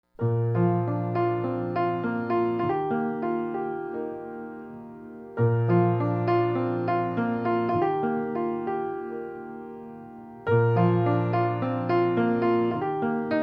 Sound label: Lullaby